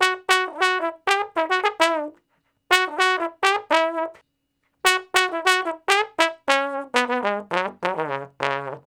Trombone Straight (Db); Sonic Foundry (Sony Creative Software); Horncraft for R&B; Solos

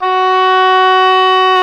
OBOE, Orchestral Winds, Oboe mf f

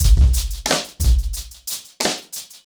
90BPM, acoustic drums; The Roots Drumloops

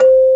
MUSIC BOX C2; AKAI S-Series CD-ROM Sound Library VOL-8

